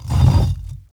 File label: Moving Stone Coffin Lid; Fairlight Sound Lib